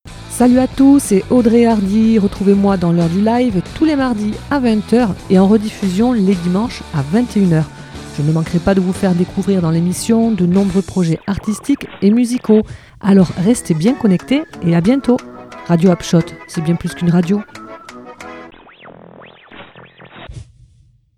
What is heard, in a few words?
Jingle; Histoires Musicales; Hapchot